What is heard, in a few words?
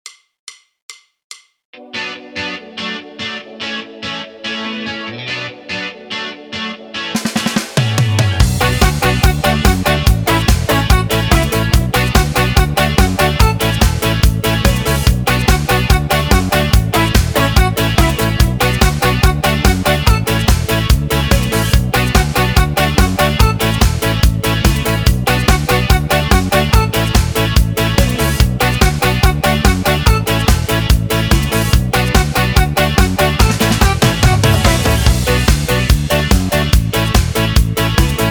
Midi Yamaha; yamaha psr sx600